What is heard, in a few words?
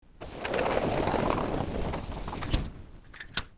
sliding synth